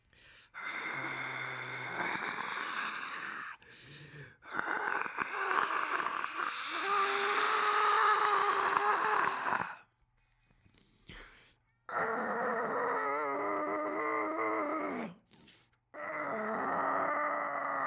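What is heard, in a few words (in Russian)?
breathing of a person in anger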